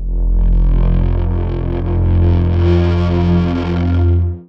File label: synthetic, stabs